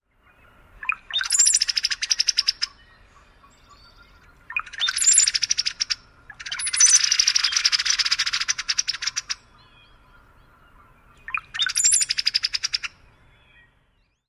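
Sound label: Brown-headed Cowbird male songs and female chatter duets; NY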